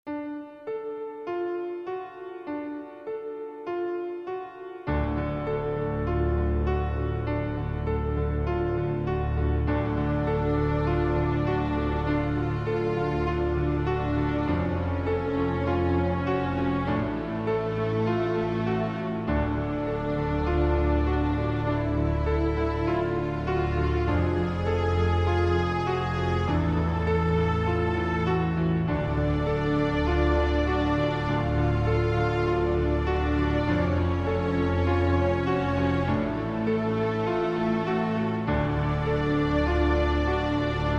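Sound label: Piano Haunting Ambience; d.i.r.t. origin of the species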